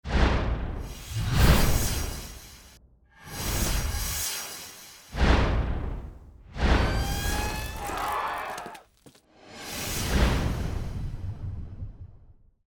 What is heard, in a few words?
SFX; NewsOneNow; Moving